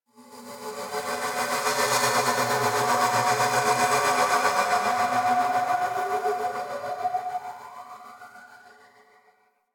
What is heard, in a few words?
Gas City Distant Textures, Ambience; Warframe; Corpus Gas City